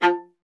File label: viola F; Miroslav Vitous; Solo Instruments VOL-1